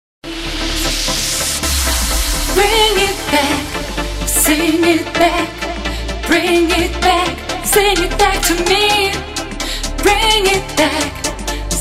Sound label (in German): Duett Sax